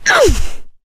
melee swing; source engine; left 4 dead 2; producer

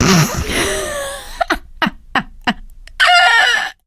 laughter, survivor, voice

